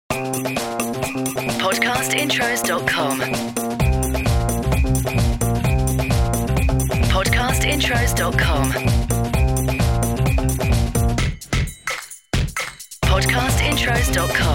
Podcast Watermark FUN Carnival Time

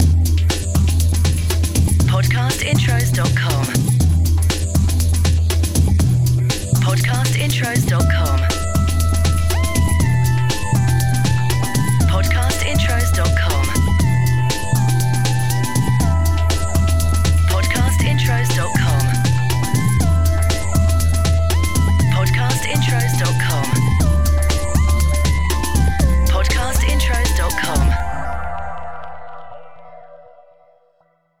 CHILL OUT; Podcast Watermark

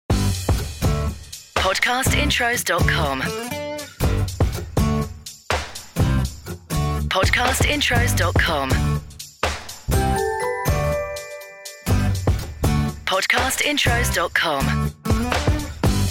DANCE; Podcast Watermark

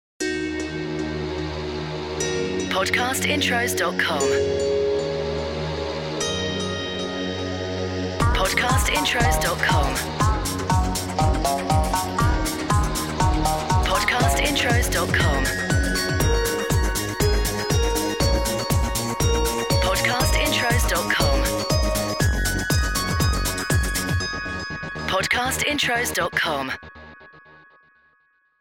Podcast Watermark WORLD

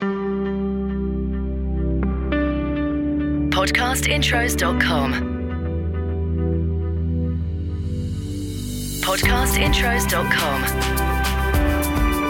MOTIVATIONAL; Podcast Watermark

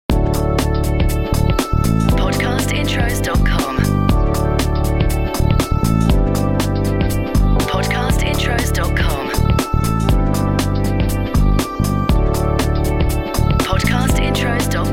BREAKBEAT; Podcast Watermark

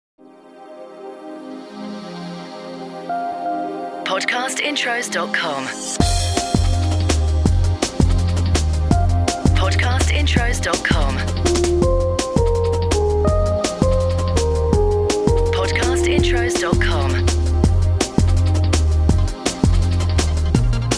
CORPORATE; Podcast Watermark

